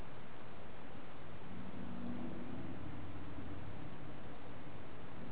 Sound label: music in empty room